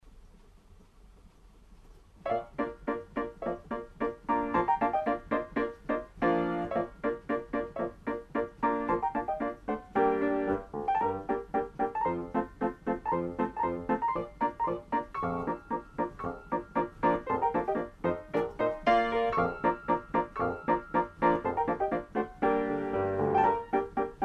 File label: Turkish March